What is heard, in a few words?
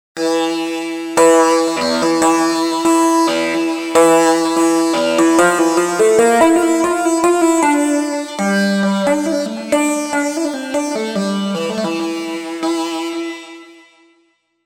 Tambura; TURBO VL